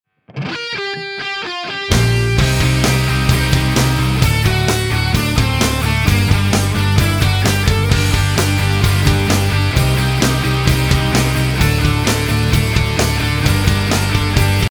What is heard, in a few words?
ringtone